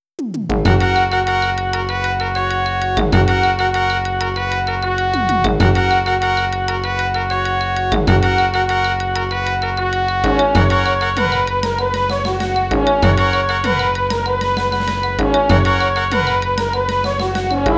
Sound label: outro music mono